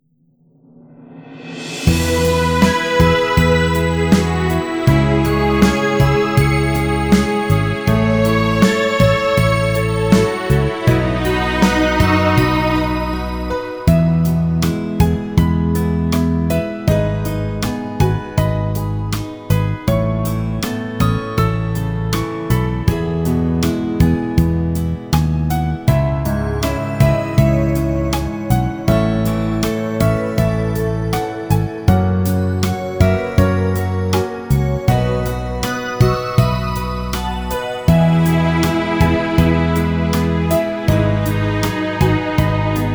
멜로디 Piano E Key

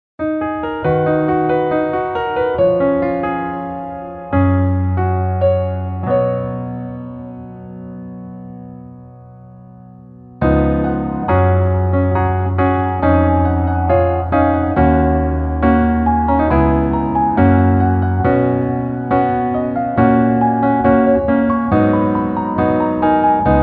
Piano ver, 멜로디MR

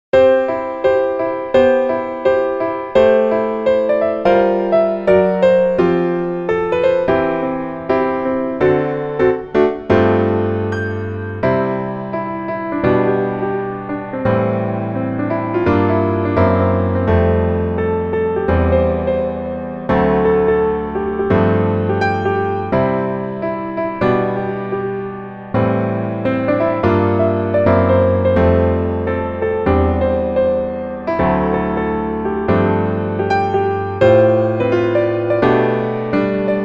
Piano ver, 멜로디MR, -1키

